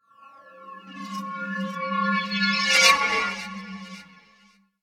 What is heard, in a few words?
Metal Suspense Noise